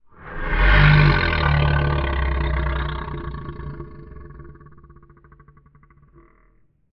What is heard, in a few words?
Monster